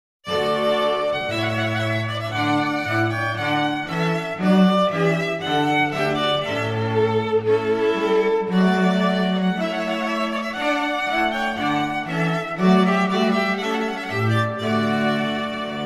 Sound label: trumpet voluntary